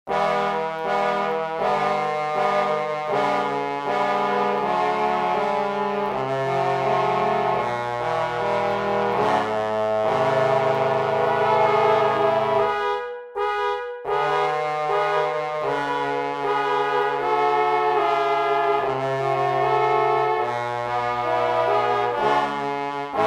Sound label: trombone